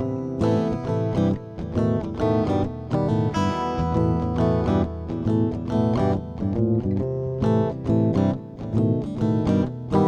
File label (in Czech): Podklad Syrovej Bluesrock